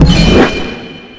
teleport

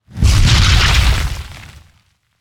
Spider Trap Grab Explode; Lurker; Evolve; Gorgon

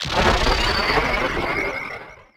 Nebula Cloud Prepare Start; Evolve; Wraith

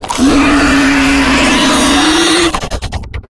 Acid Spit; Lurker; Evolve; Gorgon

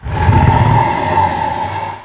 growl; Necromancy

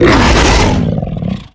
Stealth Attk Grapple; Evolve; Behemoth

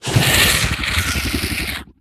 Round End Victory; Evolve; Wraith

